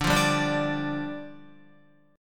D Minor Double Flat 5th, down, Guitar

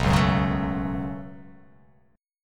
B 7th Suspended 2nd; B7sus2; strummed; JGuitar

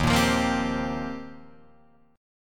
Eb 6th Add 9th, Guitar, Drop D

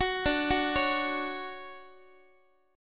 D-Major 7th D down Guitar; DM7 arpeggiated

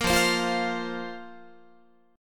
F Suspended 4th F, Guitar; Fsus4; strummed; 13,13,15,15,16,16

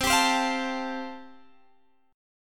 C 6th Suspended 4th C, chord

